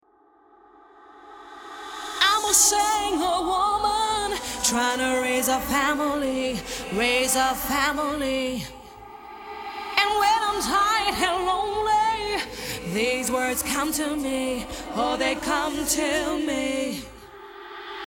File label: reverb reverse